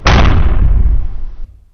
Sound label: explosion